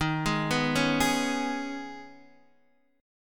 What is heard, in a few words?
D sharp 7th Suspended 4th Sharp 5th, Guitar, DADGAD